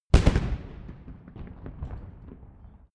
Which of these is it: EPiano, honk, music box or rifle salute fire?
rifle salute fire